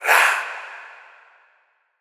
Tribal Shout "Rah"

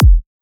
Kick F

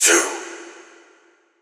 Tribal Shout "Tsuh"

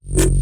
Bass Shot F

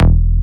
Bass E

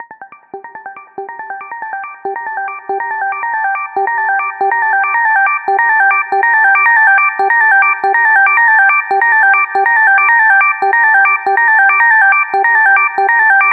Arp Lead Gm

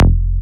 Bass F

